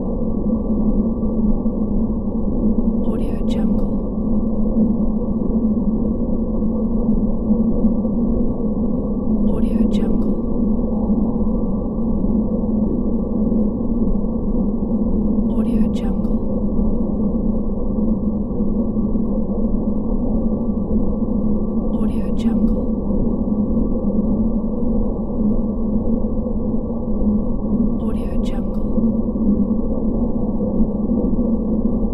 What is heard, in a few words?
ghost atmosphere loop